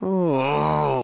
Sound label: Man Frustrated; voice; Zomboid; Lifestyle